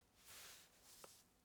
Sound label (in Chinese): 爬行